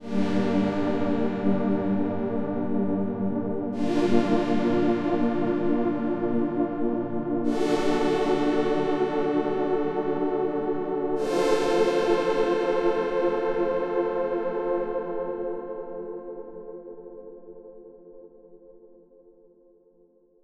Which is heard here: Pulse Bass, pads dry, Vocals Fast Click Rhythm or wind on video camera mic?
pads dry